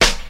high quality piercing steel snare drum sample D# key